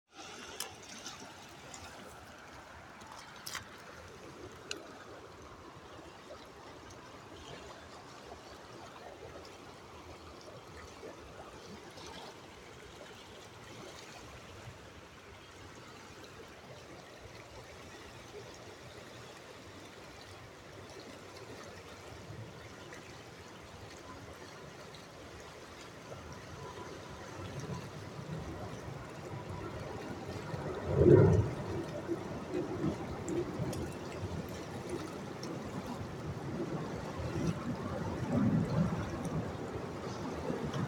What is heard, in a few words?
falls sledding hill; berea